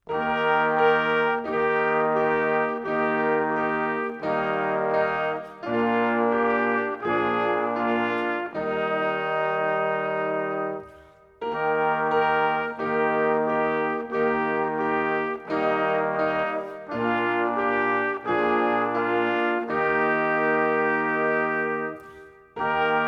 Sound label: music only